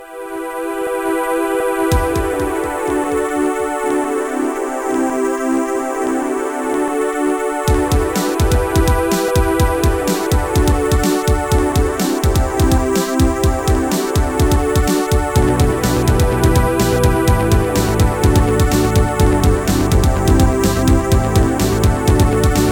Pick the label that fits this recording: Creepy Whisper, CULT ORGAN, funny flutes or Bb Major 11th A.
funny flutes